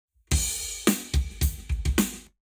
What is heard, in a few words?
Drums, 0deg; Lab Wet